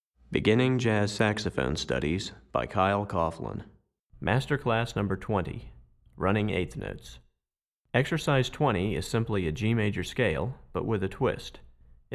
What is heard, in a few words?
Tenor Class, Saxophone; Running Eighth Notes; Master Class #20; Recordings For Beginning Jazz Saxophone Studies for Tenor, Volume 2; Kyle Coughlin